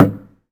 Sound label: Cello Hit; SFX Kit; Foley - Misc